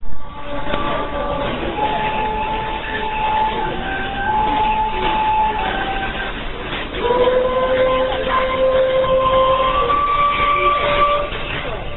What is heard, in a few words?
gagaku; ise